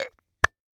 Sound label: Household Candle Glass Candle Close Lid